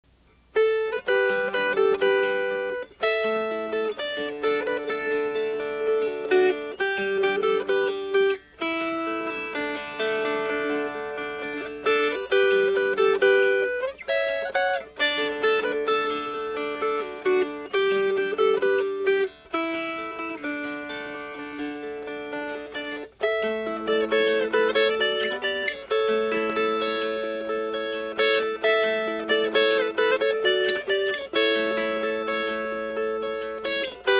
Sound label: Fingerpicked